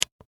Button Push, Plastic, Recorder, Large; Foley; Zoom; Camcorder; BlastWave

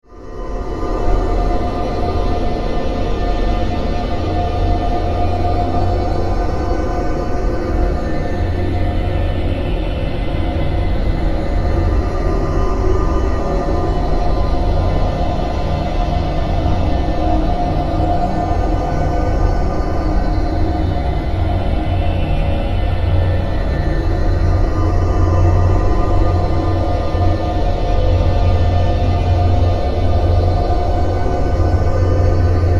Synth, Stab, Drone, Horror, 5.1

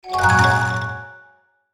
Logo Swell Mallet Delay Reverb; Sonic Logo Sound Effects; Production Element Imaging Element Accent Transition

